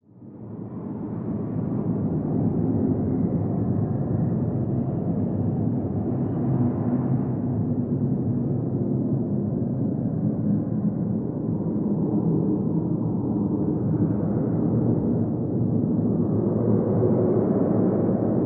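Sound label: Spirit Wind Desolate, ghosts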